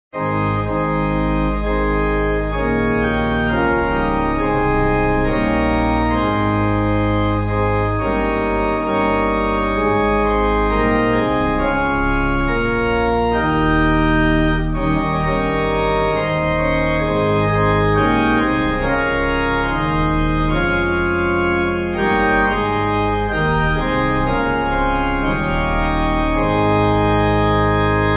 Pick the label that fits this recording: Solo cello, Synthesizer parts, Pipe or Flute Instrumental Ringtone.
Pipe